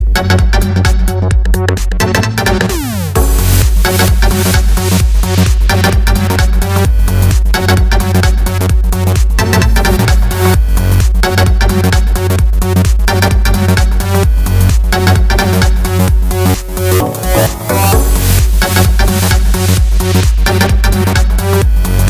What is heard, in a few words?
Electro